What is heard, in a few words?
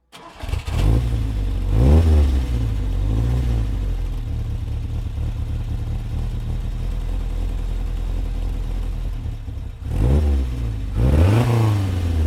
Ford Cortina 1600 E 1970; Taunus 1600 GXL